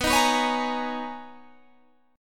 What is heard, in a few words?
B Major 13th B down Guitar Drop D